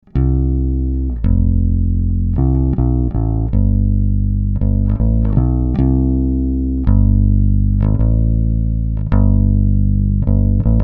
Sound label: Bass HiZ RME UFX